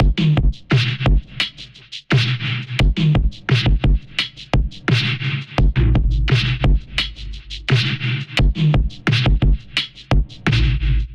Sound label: Sun Wash Kit 86 bpm, Drums, Basic; MIDI Clips; Drive and Glow; Ableton; Factory Packs